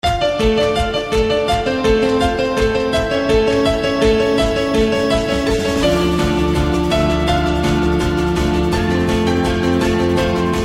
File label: piano